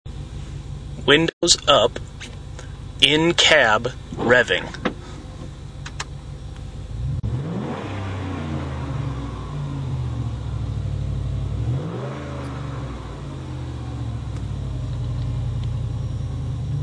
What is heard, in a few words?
W-U revving, aero-turbine muffler